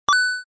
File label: Game coin collect